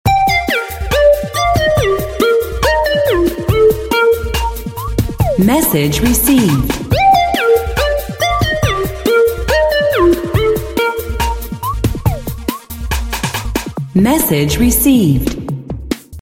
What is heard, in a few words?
nokia sms ringtone